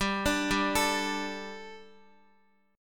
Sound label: G Suspended 2nd G, down, Guitar; Gsus2 arpeggiated; JGuitar